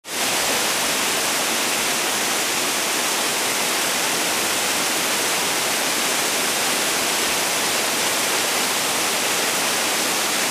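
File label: sound of noise of fountain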